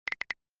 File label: noisy Audio Input; jiti meet